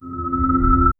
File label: create a soft minimalist